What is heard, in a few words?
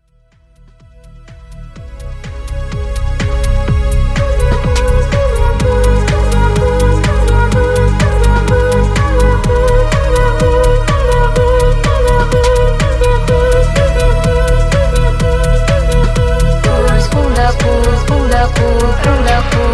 phonk remix